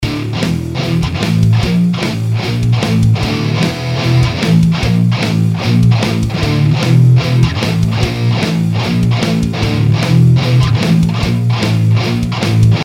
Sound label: Running riff